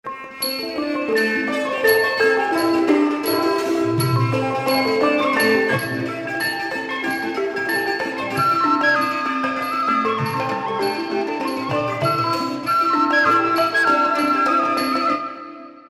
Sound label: Thai Orchestra Phrases; Spectrasonics Heart Of Asia